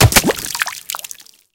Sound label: bullet hit water; War Thunder